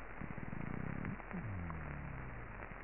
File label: E guttatus